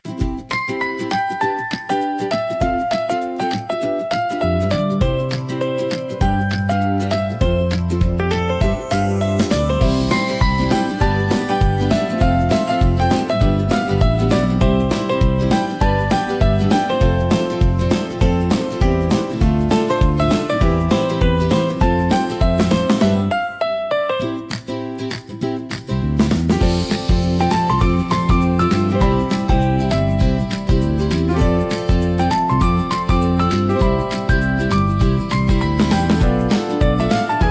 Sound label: a happy acoustic mood; bright path